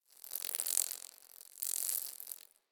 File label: Insect Fly By; Warframe; Infested Eris; Ambience; Outdoor